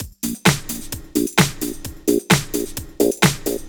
Swing, Drum Loops, 130 BPM; Underground UK House & Electro

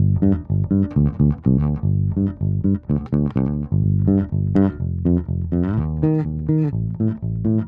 Bass, 125bpm Dm, Nu Disco; Zero-G; Construction Kits; Speedy

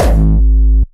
Distortion Kicks, Bassdrums

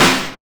SD, dance drums hip

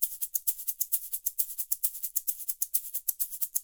STEGG 132, NYC Percussionworks, LOOPS; Sampleheads

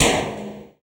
FX Dance; Sound & Vision - Gigapack II

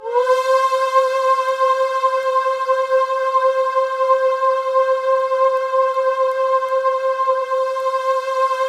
C5, Synth Leads

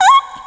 squeek; Sonic Foundry (Sony Creative Software); ILONA

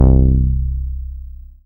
BAS MKS-50; Best Service Gigapack 2

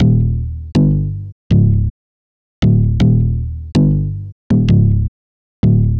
Punch Bass; Sonic Foundry (Sony Creative Software); Knutrix Drum & Bass

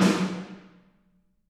SD, Drums; Bob Clearmountain Drums I

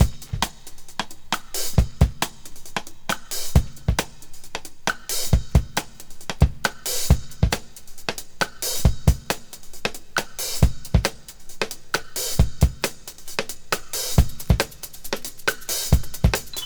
breaks

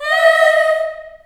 D#4 CHS EH, CHILD EHS, Choir; USB Soundscan vol.28; Choir Acoustic & Synth; AKAI

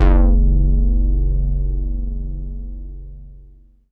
Chorusio f#1, Korg Poly-800

